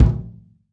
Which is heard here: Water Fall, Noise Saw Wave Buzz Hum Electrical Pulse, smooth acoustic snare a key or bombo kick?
bombo kick